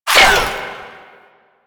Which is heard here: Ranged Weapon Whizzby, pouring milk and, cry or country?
Ranged Weapon Whizzby